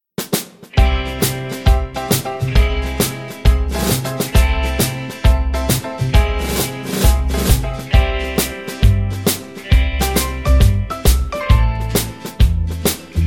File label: (C) No Backing Vocals